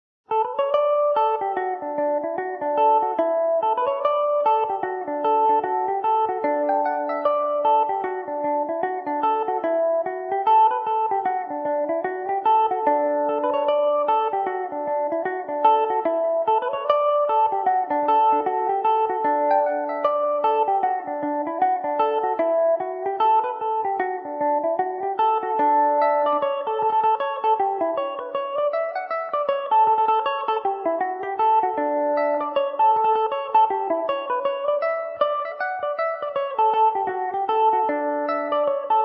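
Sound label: D major, Irish mandolin; The Heathery Cruach; Revelation Jaguar electric mandolin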